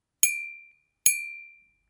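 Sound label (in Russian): звуки посуды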